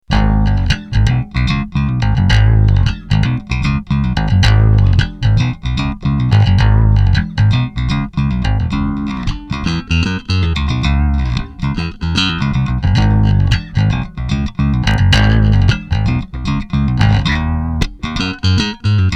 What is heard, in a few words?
riff